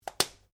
Rifle Remmington 700 7.62 mm Scope Cover Fore Close